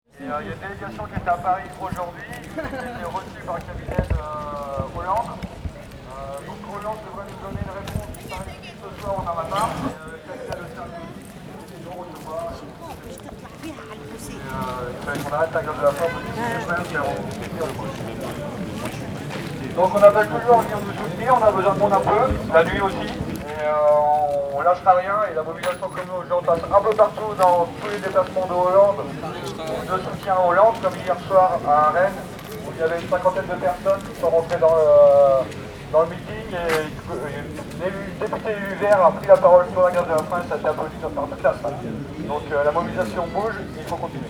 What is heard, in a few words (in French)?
ambiance cyclo tracto; Notre Dame des Landes; greve faim avril 2012; meeting derv